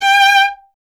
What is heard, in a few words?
viola, solo instruments